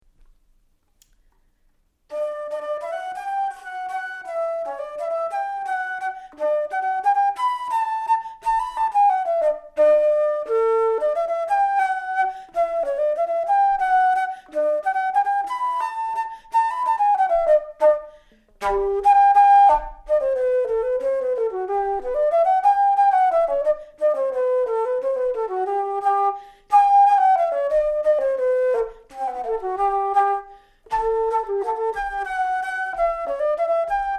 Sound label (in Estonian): valts, flööt; Kingu valts